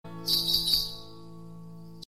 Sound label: Message Alert